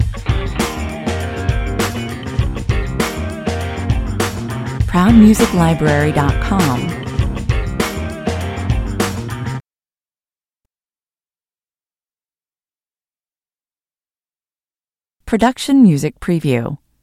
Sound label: funkin yell loop